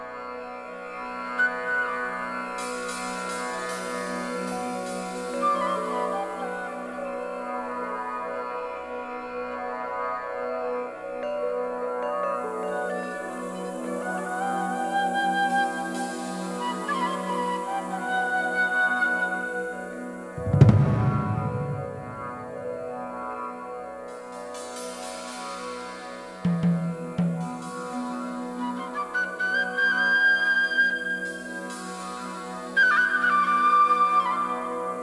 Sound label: Rest Area Music Loop